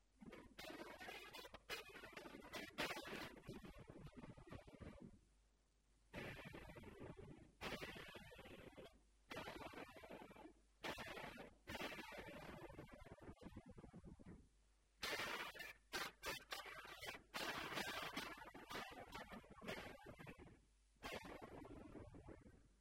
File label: JMI LP